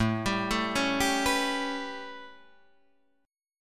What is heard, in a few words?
A 9th A, Guitar Open D; chord